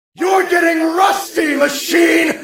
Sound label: rusty machine